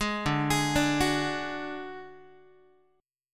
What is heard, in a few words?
D Major Flat 5th D Guitar, chord